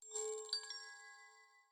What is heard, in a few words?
Sunray Notification Long; Yota Yotaphone-2